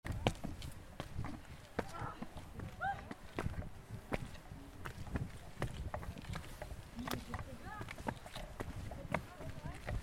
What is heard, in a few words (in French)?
AMBIANCE NON IDENTIFIABLE; college vallee du thore